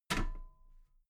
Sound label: metal ladder step soft